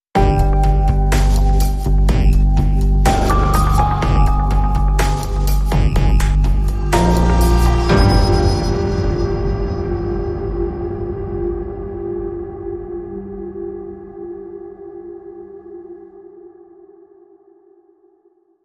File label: Tense Electric, Bumper